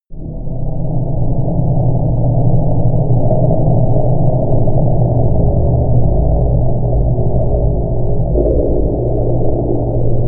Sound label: drone